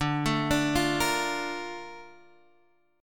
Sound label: A sharp Major 7th